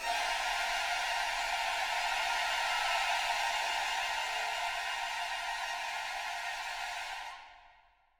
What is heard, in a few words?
Combat Threat 130 Cm; Combat Core1 Threat0b 130 Cm 44 1